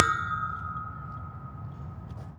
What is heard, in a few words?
Singing Poles, percussion; Houston; Moody Park